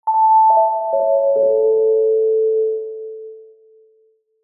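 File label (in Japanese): お知らせ・終わり・リバーブ有り; 開演ブザー; 演劇・舞台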